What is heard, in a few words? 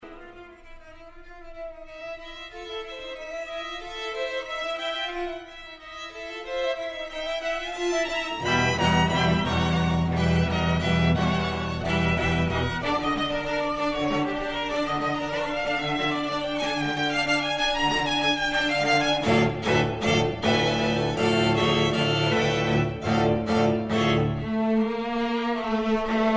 Nigun for string quartet